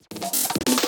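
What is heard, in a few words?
drum beat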